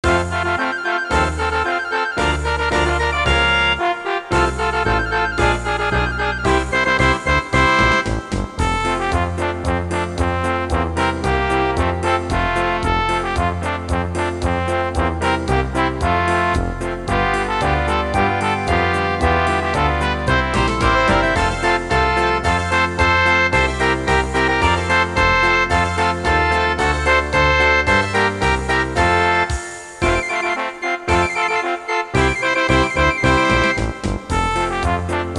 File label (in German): zirkuslieder playback